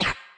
spirit hurt; FlyForInsanity

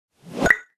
life refill animation; Candy Crush Friends

